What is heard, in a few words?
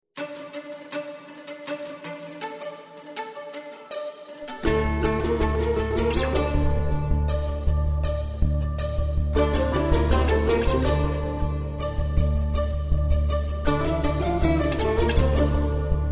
Sound Track